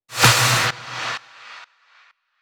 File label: Vocoder FX Clap, 128 BPM; Vengeance Samples; VTDS2 Song Kits; Vocoder Mother Earth